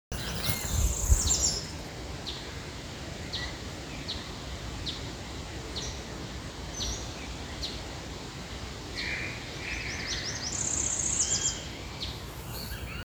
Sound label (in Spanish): Frutero coronado